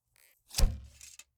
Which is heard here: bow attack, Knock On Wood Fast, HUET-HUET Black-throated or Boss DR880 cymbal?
bow attack